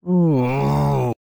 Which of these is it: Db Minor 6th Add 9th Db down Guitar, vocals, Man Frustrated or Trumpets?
Man Frustrated